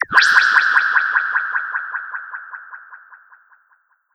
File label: Old Dub